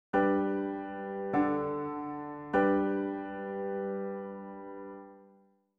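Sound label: G major PAC; melodic dictation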